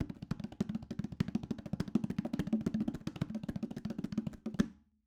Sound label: conga Roll Long; raw perc one shots; SEISMIC Drum Sample Pack; Slate